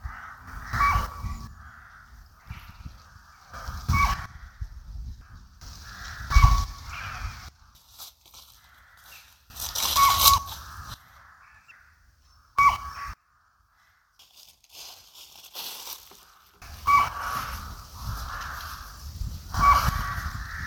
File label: Call